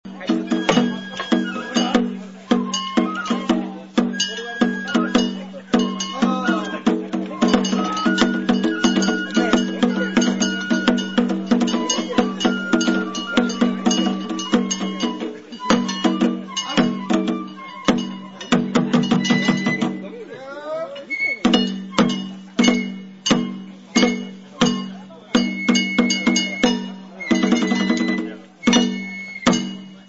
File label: banjo